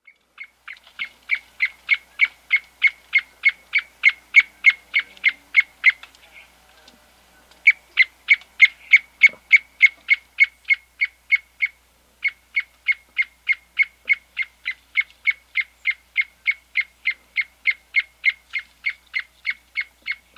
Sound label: White-Fringed Antwren; S. America